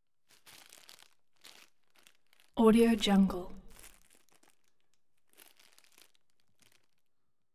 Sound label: hotdog package handle; collanderson